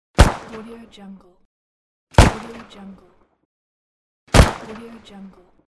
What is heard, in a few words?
pistol shot; tibasound